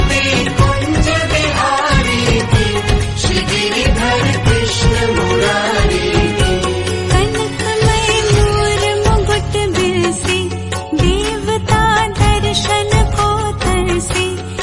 Krishna Bhajan Ringtone

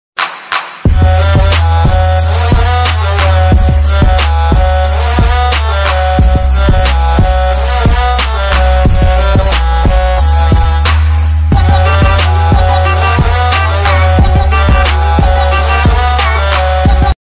Instrumental

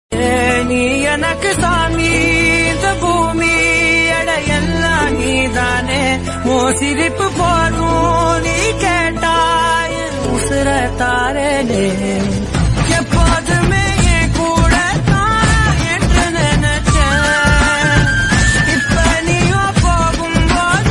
Tamil Ringtone

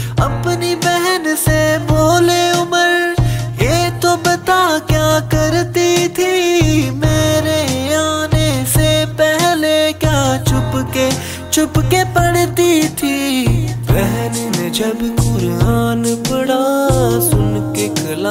Naat; Ringtone

